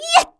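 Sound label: MON ATTACK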